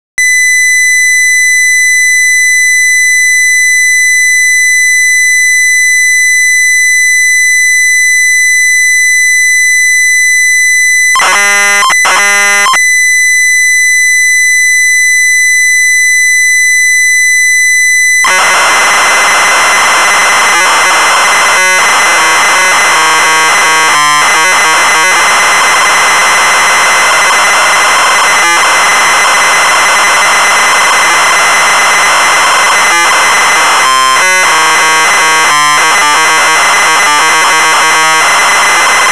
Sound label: CONVEYOR